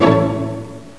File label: ORCHEST